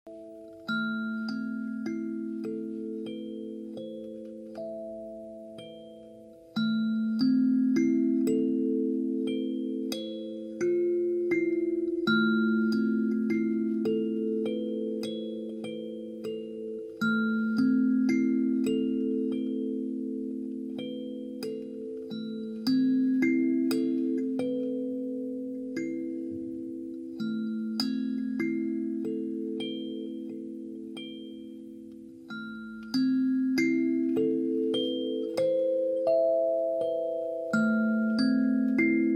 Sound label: Sound Meditation Suite